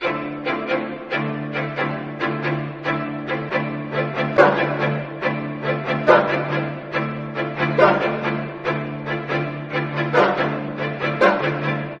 strings, Sad Loops

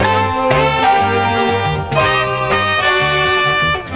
horns, Phonky loops

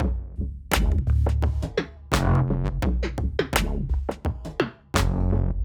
fat robot, Loops Electronic

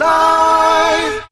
die, Voice

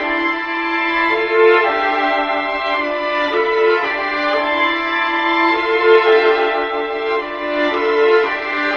strings requiem, Sad; Loops

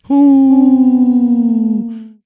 "hoo", voice

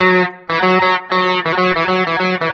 guitar, Loops; Phonky

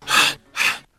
breath inhale exhale; ooh ahh

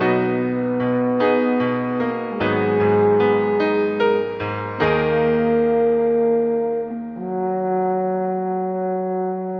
horns and piano, Sad

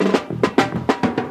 Drum loops, Rolls

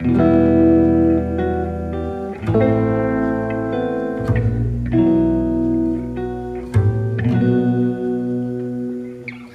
guitar, Sad

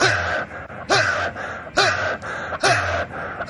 huh huhuh ha hu ha hu ha hu, voice; ooh ahh; heh huh

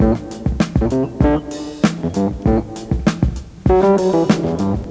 full, Loops Phonky

